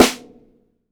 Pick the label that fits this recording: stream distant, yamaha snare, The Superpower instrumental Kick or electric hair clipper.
yamaha snare